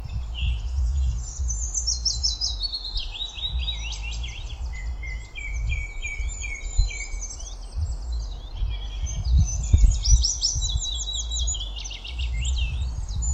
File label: dawn chorus; RSPB magazine “Nature’s Home”